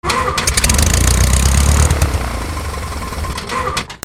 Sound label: flight